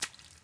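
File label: wet run, footsteps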